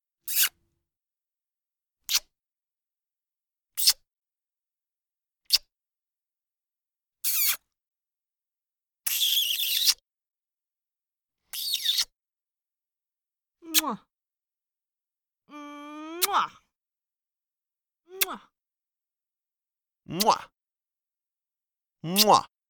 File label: Kisses